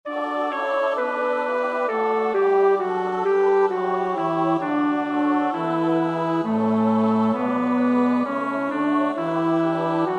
bas; Bach (Johann Sebastiaan); BWV 080

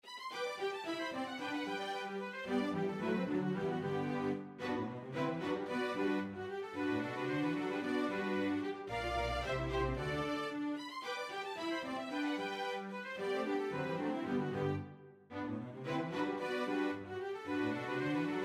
sopraan; Poulenc (Francis); Gloria

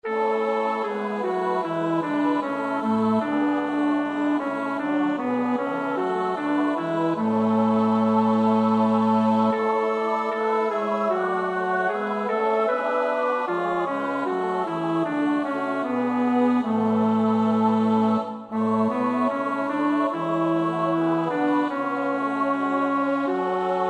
bas, koorpartij oefening; Bach (Johann Sebastiaan); BWV 197; Du süße Lieb, schenk uns deine Gunst

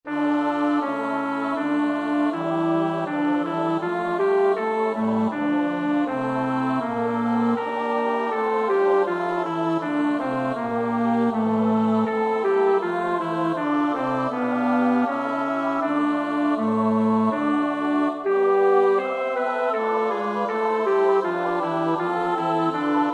bas; Bach (Johann Sebastiaan)

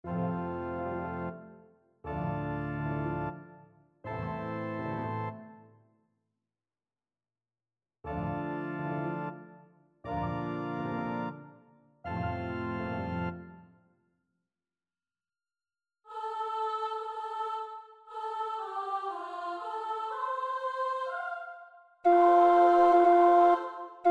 tenor 1; Rossini (Gioacchino ); Petite Messe Solennelle

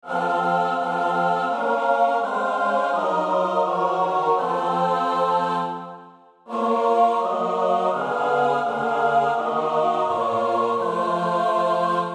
S-A-T-B, koorpartij; Bach (Johann Sebastiaan); BWV 245 Johannes Passion; O hilf, Christe, Gottes

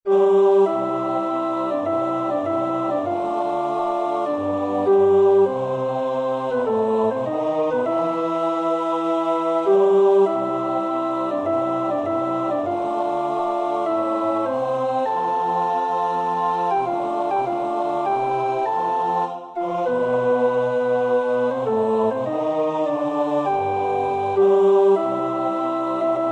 Jünglingswonne, tenor 1, Männerstimmen; Schubert (Franz); Vier Gesänge für vier Männerstimmen, Op. 17; 1.Jünglingswonne, Op. 17, No. 1

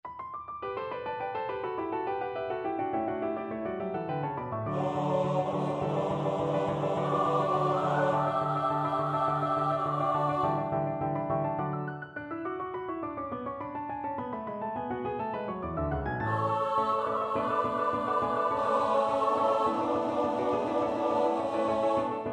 S-A-T-B, koorpartij oefening

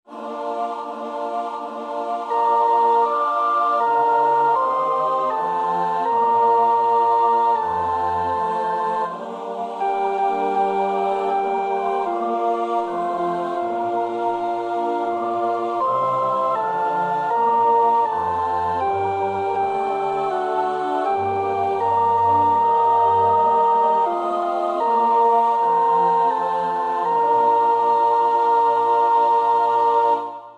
sopraan; Bach (Johann Sebastiaan); BWV 027; 6 Welt, ade! ich bin dein müde

